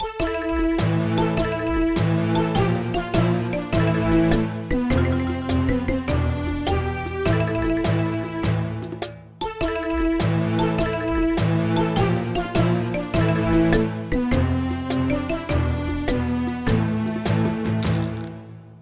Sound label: Bgm lobby; ovenbreak s1; cookie run